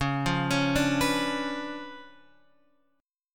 C sharp 7th Flat 9th, Guitar, Open F; arpeggiated

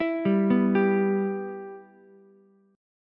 B Suspended 4th Sharp 5th B Ukulele; Bsus4#5 arpeggiated; JGuitar

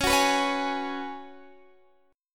Db 7th Suspended 4th Db down Guitar DADGAD

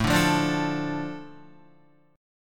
A Suspended 4th Sharp 5th A Guitar, chord; Asus4#5; strummed; 4,5,5,7,5,5; 4F,4A,5C,5G,5C,6E; JGuitar

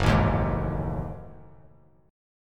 Gb Minor 6th Add 9th Gb Bass Standard; strummed; JGuitar

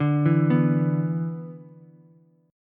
B-Minor Double Flat 5th, Ukulele; Bmbb5 arpeggiated; JGuitar

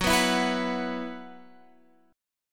F sharp 7th Suspended 4th, Guitar, Open F; strummed; x,13,11,11,14,13; F#7sus4 Chord

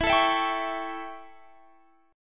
A 7th E, chord

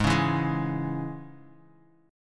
G sharp Suspended 4th Sharp 5th; strummed

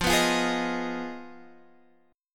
F Minor 7th Flat 5th, chord; Fm7b5; strummed; x,8,9,10,9,11

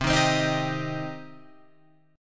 C Minor C down Guitar Open F; strummed; JGuitar

